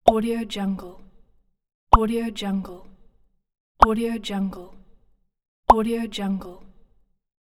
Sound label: pop button